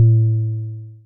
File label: SPACE DRUM